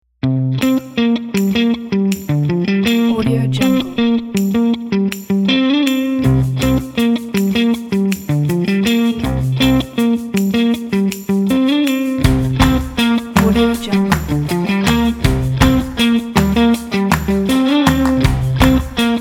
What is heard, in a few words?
funk upbeat